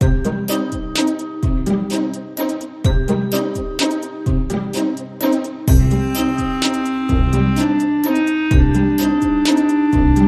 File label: Modern Percussion